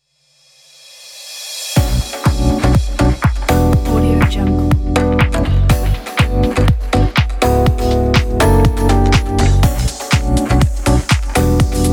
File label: fashion lounge house